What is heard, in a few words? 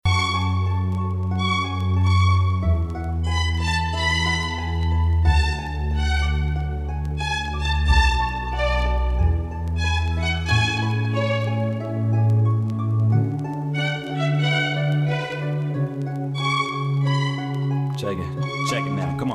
instrumental